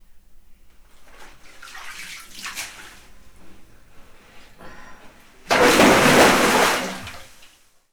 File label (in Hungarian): papaszemeskajman vizet csapkod